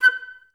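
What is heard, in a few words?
flute staccato F#5; Best Service; Peter Siedlaczek's Advanced Orchestra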